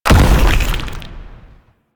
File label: Monster Projectile Explosion; Warframe; Infested